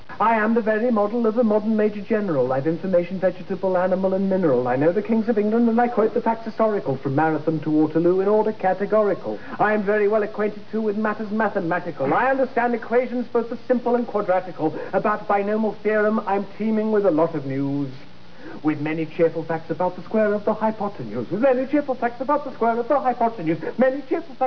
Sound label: Marcus sings